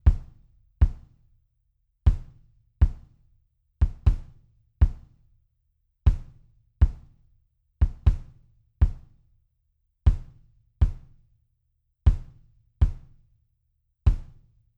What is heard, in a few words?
Kick